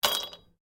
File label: Ice cubes Glass